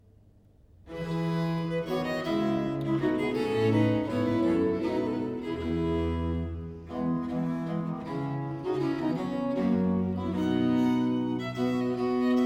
suite no. 3 in four parts, in f major ii. cou, english music for viols